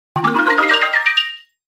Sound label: Htc Ringtone; Htc Desire